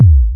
PERC FX

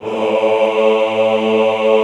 AHH A1, Classical Choir; Best Service; Extended Classical Choir; AHH MIX

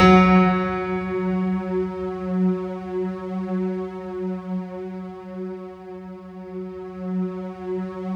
F#3, Synth Line

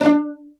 PIZZ CELLO D3 L; Greytsounds VOL-1; STEREO PIZZ2

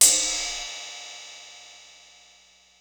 Ride, Ensoniq ASR-X Pro

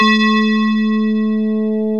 DIGITAL SYNTH; OMI - Universe of Sounds

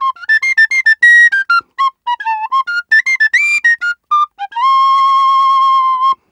Penny 103bpm F, Penny Whistle

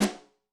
Smacker, Snare; Yamaha DTXpress-II

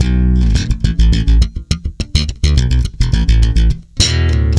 B, tweaky, bass; Spectrasonics; Bass Legends

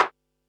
snaredrum, Pearl SC-40

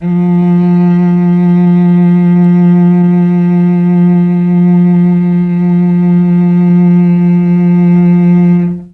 F2 300 CELL, Mellotron, STRINGS; Mike Pinder - Mellotron Archive CD

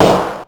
Snare, Ensoniq ASR-X Pro

